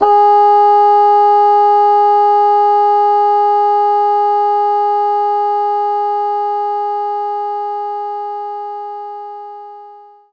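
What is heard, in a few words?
Bassoon G#4; knights try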